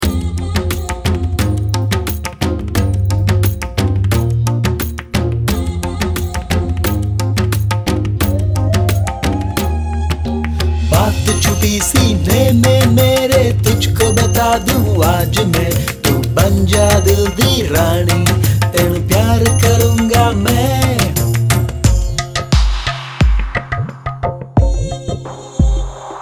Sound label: Indian Hip Hop; Hitman 2